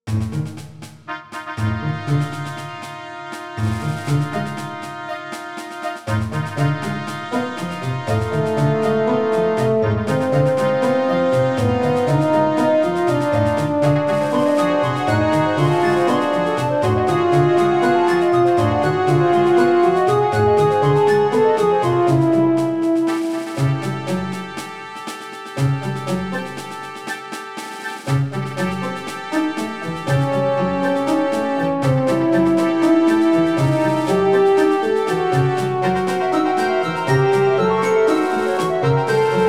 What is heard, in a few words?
Self Destruct Egg Timer; Space Quest V reorchestrated and remixed 2020